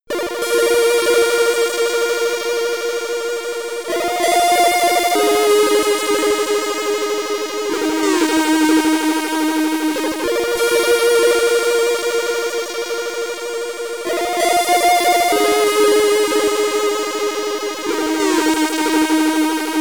Ending, SID Stereo, Commodore 64; neuronics

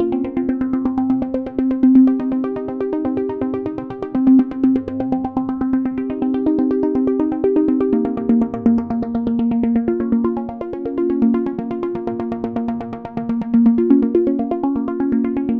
arcade arp; lucah

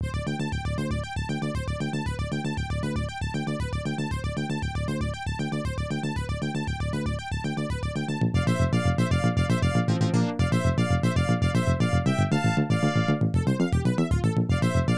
Unused BGM

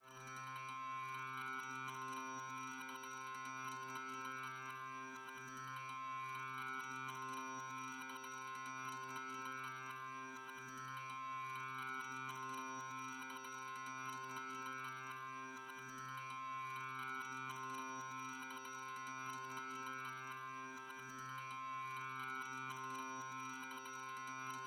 Piano Effect

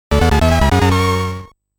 Level Complete; Turrican Anthology Original Sound Version Vol. 1

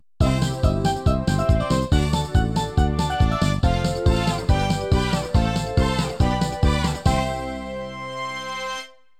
Win Jingle; Wacky Races Starring Dastardly Muttley; PS2

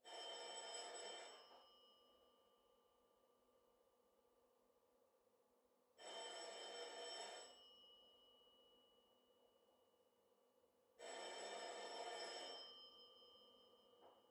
Ambience Phone; Deployment

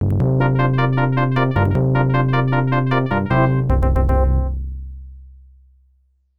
Name Register Finished (1st Place); Ghouls 'n Ghosts

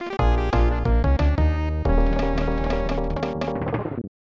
Lose Life; Donkey Kong Country 3; SNES; Rocket

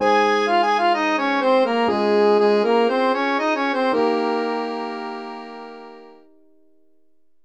Harbour; peppa pig world adventures

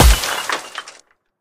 Prize Egg Tap Stone

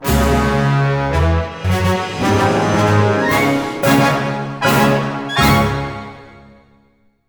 Giga Torch Wood Outro Win

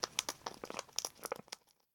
Seed Handling

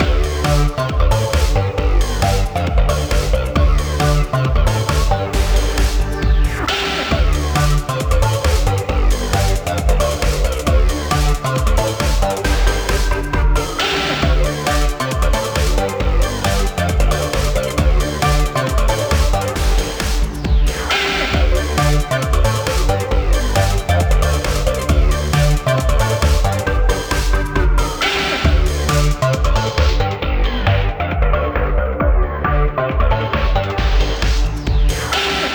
Parole Ball Loop - 135 bpm; fashion police squad beta

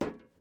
metal step; teardown; ps5 windows xbox series xs

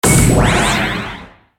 Match Release Health

